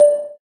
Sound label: Video Record, phone ui; L20 D105f; LG